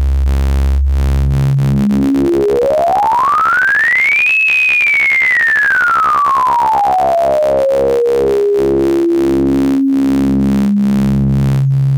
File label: sine sync